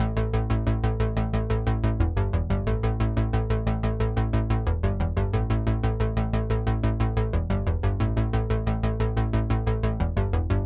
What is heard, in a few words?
Bass Sequence A 4 Bars; Vostok Inc.